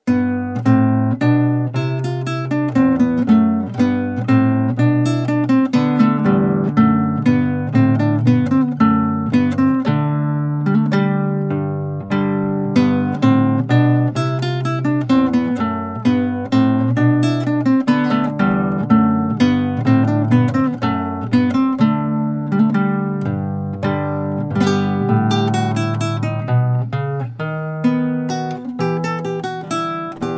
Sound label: Minuet in G